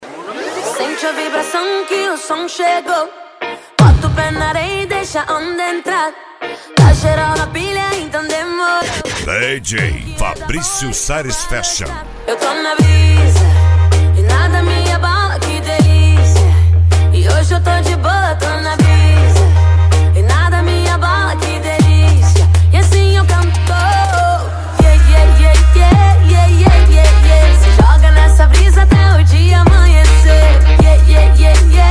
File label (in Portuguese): sound car mala aberta e mega funk